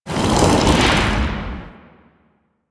Spit Attack Projectile By; Warframe; The New War; Archon; Nira; Abilities

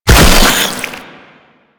Proto Infested Maggot Pain A, Enemies, Vocals; Warframe

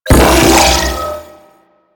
Riot Moa Shield Activate; Warframe; Attacks

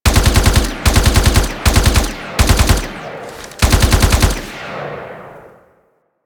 Shoot; The New War; Lua Valley Ext; Burston

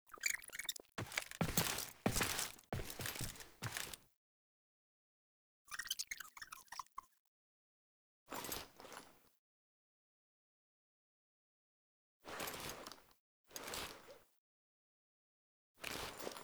Keys Foley; Nyx; Warframe; Nineteen Ninety Nine; Quests; Hub

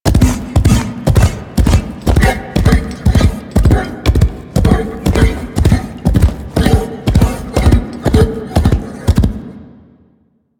Horse Steps Gallop Loop Default; Vehicles; Duviri

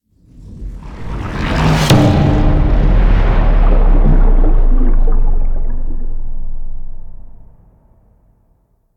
Reliquary Finger Thump; Warframe; Rail Jack

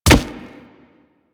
Infested Charger Step Default, Footsteps; Warframe

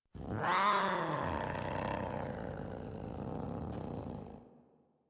Kavat Vocal Idle; Warframe; Khora

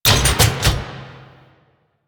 Sniper Mech; Kahl Sniper

